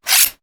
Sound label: knife sharpener